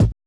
tom, Roland MC-909

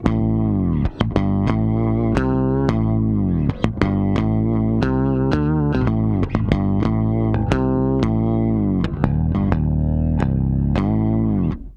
SHOOT UP, Bass, 90 BPM; Sampleheads; Ultra Freakin' Bass

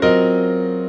CHORD L, Drum & Bass 140 BPM; Ueberschall; Drum & Bass Flash Trackz

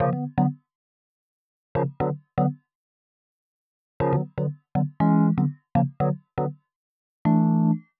120 Rhodes Riff Chords E, Keys, Nu Jazz House; PowerFX

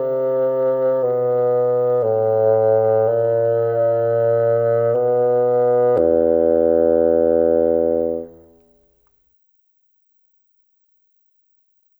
Bassoon, Cinematic; Sonic Foundry (Sony Creative Software); Orchestral Series VOL-3; Cinematic 27

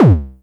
909-ish Kicks C2, Tuned Kicks; LIMAT Div Dept; Drums

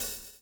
swing

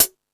Latin Layers, drum machine

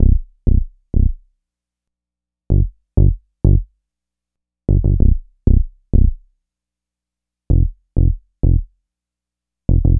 Dubby Bass; Dr. Fink's Funk Factory

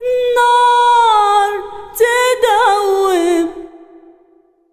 SINGER A, Arabian Traditions

